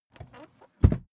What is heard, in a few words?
suitcase close case quick